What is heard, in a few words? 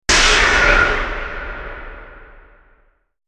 hit big